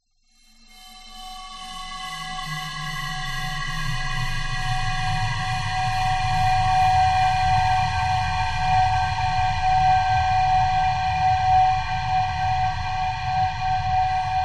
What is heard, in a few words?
drone whine shrill descending fm; sound design; zapsplat